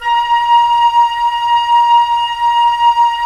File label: Flute, Roland LA Composer